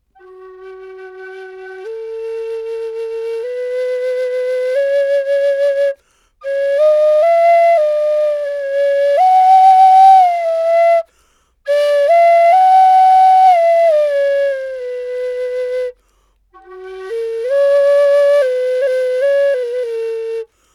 Flute Dry

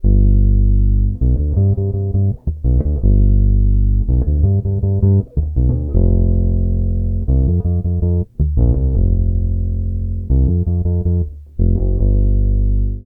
Neck Rolloff

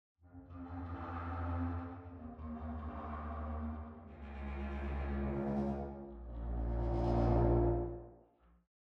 Traditional Contra Bass Ensemble Wet; VENTO

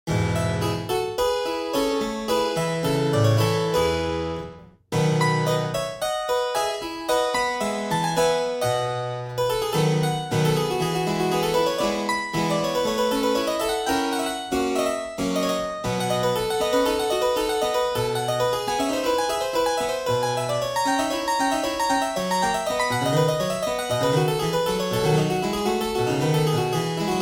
English Harp Lower

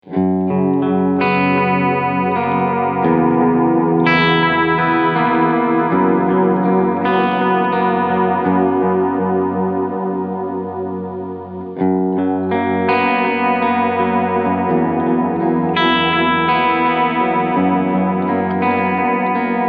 Medium Delay Chorus; XVive Echoman

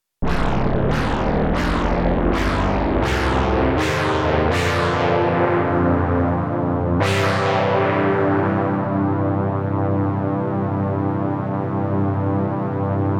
REPRO-5 4 Osc Monsterlead UNISON HQ

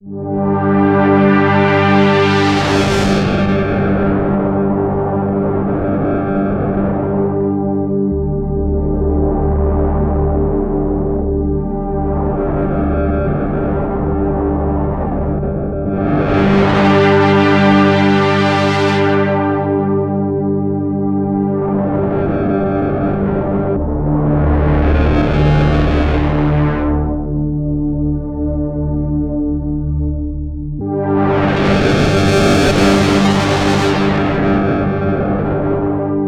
creepy pad; ambika

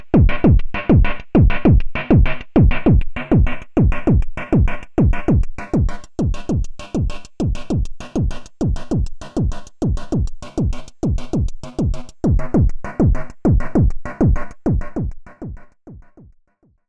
Loops And Drones

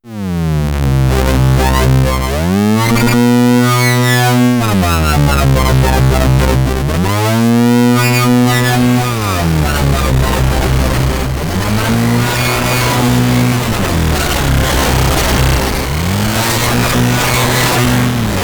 Anamono Xmini one Voice Glitch Shifter; Gotharman

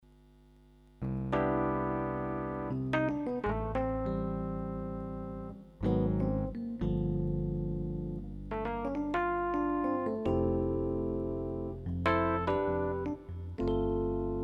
Pa3X LE EPiano

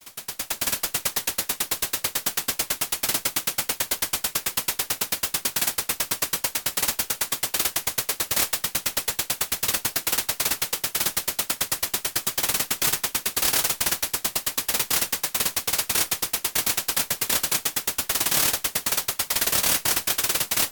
Percussion Sequencer Glitch; Pittsburgh Modular Lifeforms

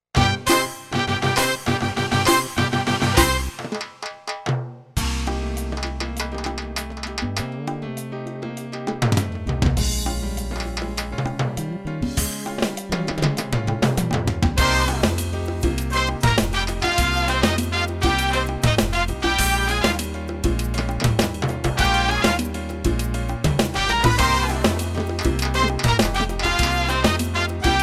Korg EK50 Song Latin

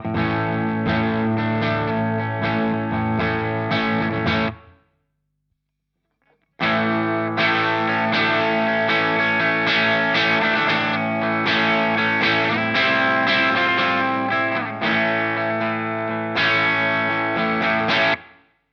Vox Valvenergy Mystic Edge FX Loop

